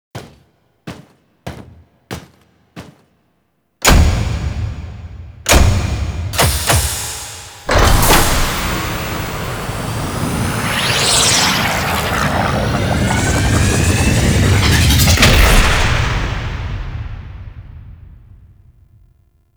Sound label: Extraction Outro Cinematic; Lotus Outro; Cinematics